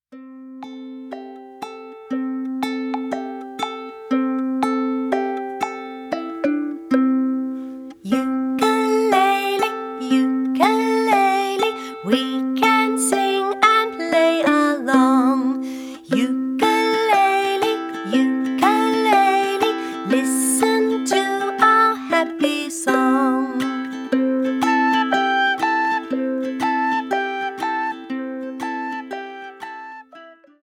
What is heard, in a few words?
Happy Ukulele